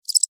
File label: Cricket Chirp, Cricket, Chirp, Single, Insect, Bug; Crickets; Animals:Insects